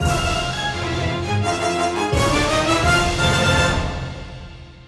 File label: Battle Cleared (Bwa Enemies); Mario + Rabbids Kingdom Battle